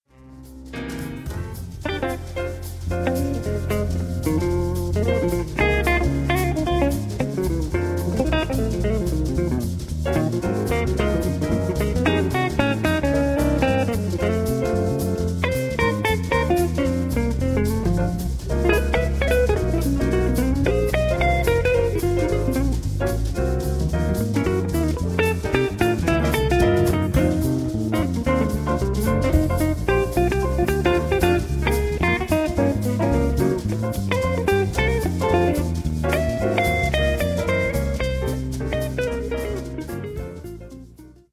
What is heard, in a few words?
blues